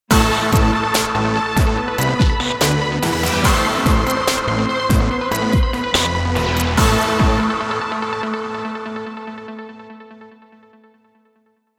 electro stinger

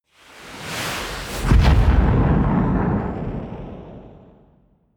missile medium fire stationary distant

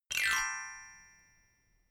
notification glockenspiel slide down hard

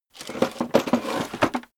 tool draw

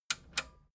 metal button radio click disable slow

